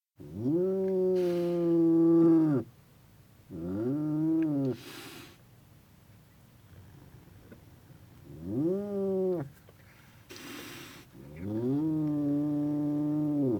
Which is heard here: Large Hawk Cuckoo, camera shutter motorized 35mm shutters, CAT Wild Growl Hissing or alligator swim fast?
CAT Wild Growl Hissing